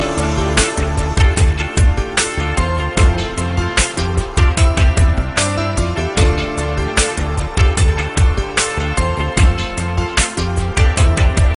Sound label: piano soft